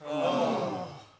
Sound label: boo near miss; PC Gamer IT CD 45